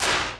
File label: good steel snare drum sound d sharp key